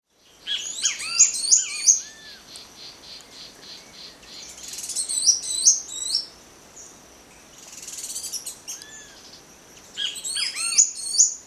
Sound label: Xenops minutus; Comand